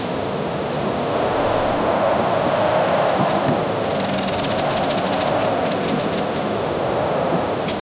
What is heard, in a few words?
harsh wind gusts dry reed, insects